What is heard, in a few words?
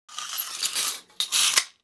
fx scratch, Cartoon Film Effects